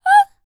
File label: Vocal, R&B & Dance, FEM. HUMAN